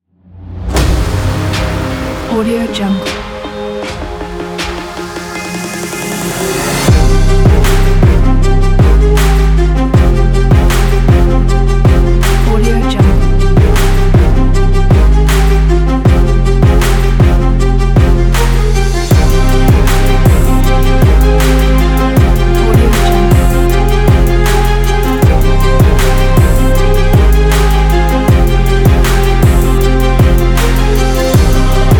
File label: epic hip hop beat